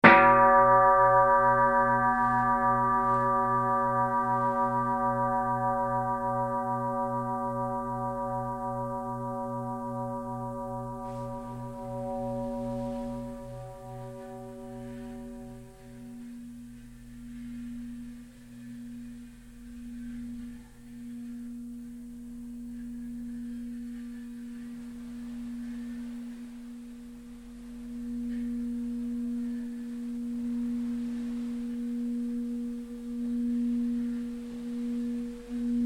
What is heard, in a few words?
Singing Bowl Of Tibet; Played By Tibetanologist Taught By Lamas - Rare Bowl; Bells; Tom Hutchings